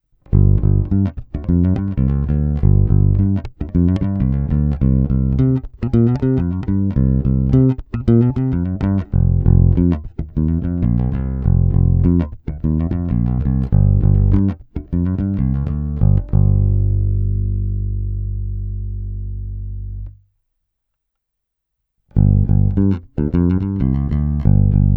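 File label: Jazz Bass; Sadowsky NYC Ultra Vintage J Bass Guitar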